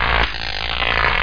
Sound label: kazap; The SoundBlaster Multi Media Experience vol 4